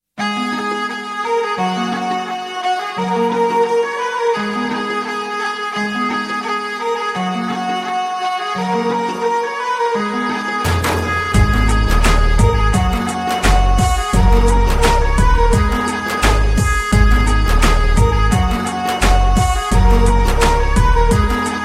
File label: Ringtone